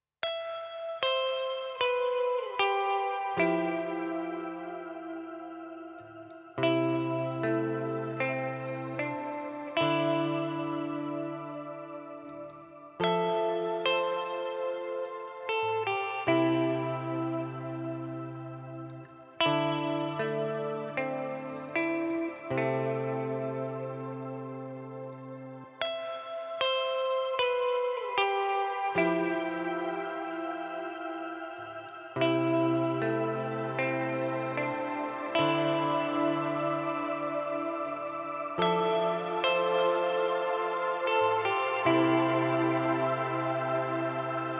Shimmer Reverb GFI Systems Specular Tempus

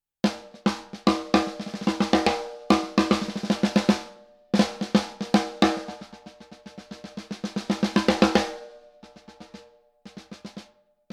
Gretsch Bell Brass played

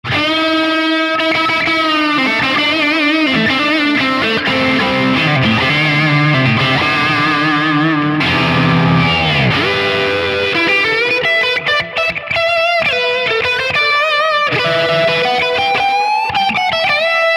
Pushed Brit Lead; Neural DSP Archetype Rabea

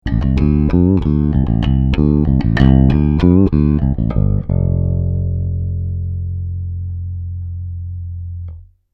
finger parallel

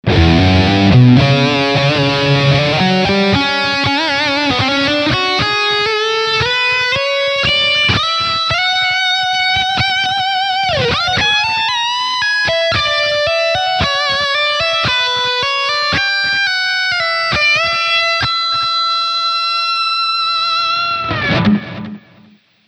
Delay Comp

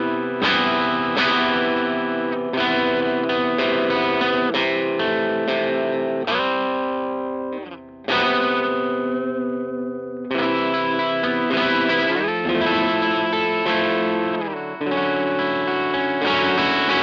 DC Junior Fat Crunch; Harley Benton

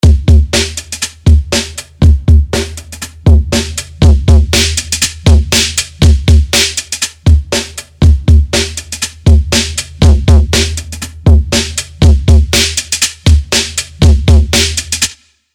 Drums 808

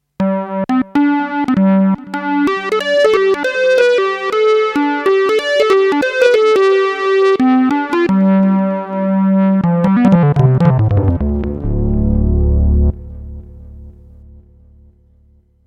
Leadsynth

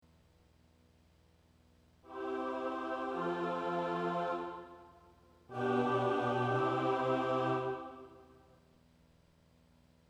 Pads

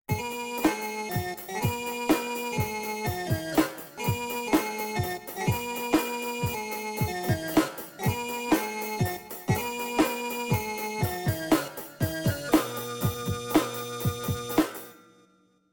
Pitch Shifter

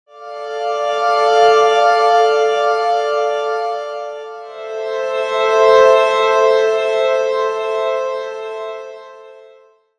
Swells

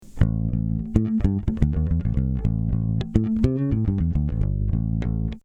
AMS Neve Bass eq comp

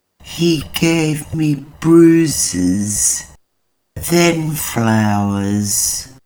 Vox Slower

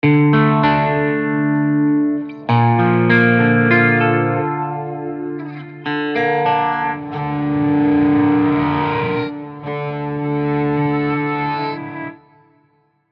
Scoring Bass Guitars Melodic Pulses and Phrases

